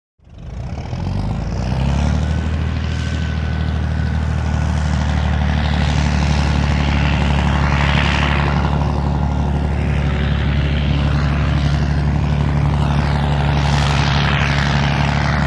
Bog Cutter; Bog Cutter Start Up In Long Shot, Approaches Mic Slow, Stops, Idles And Switch Off.